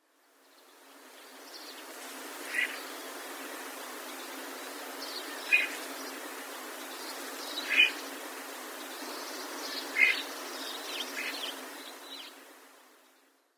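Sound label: common starling, watari; hoshimuku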